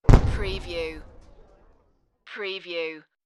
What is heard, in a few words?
Artillery